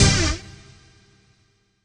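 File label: Rave Synth, Dance Mega Jungle Rave; Best Service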